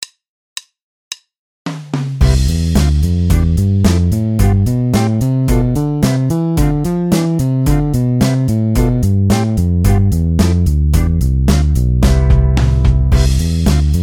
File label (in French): gammes